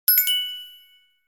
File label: correct